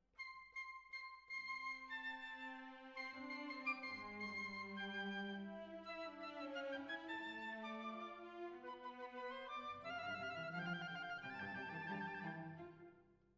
Violins Over

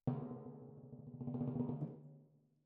Timp Close

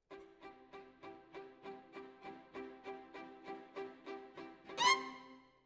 Violin Solo

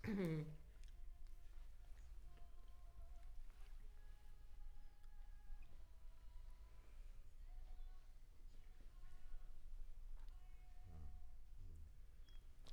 Center u47, Choir

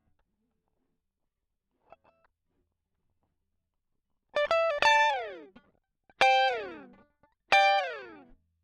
Guit Solo Line

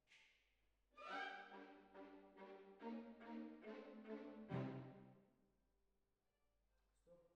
Cbasses

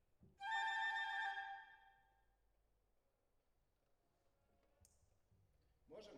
Cello Solo

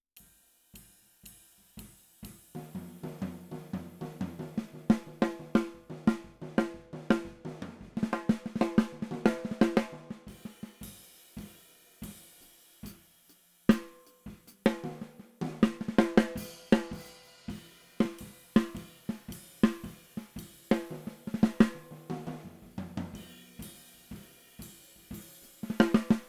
SNR

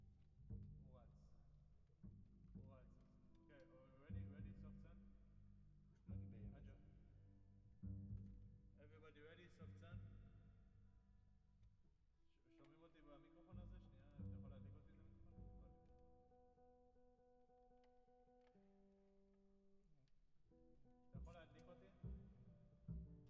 Drums Verb

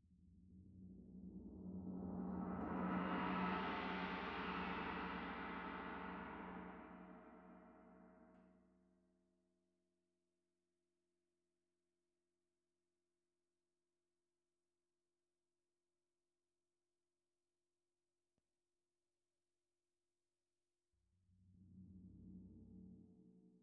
Tam-Tam; Tam-Tam Rear

